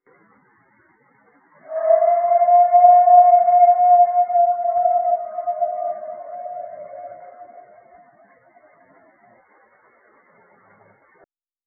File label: Bigfoot Scream in Backyard